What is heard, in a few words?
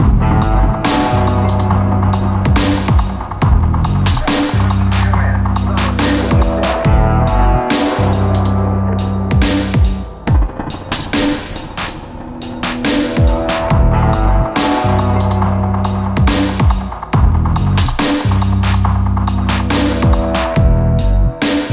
menu music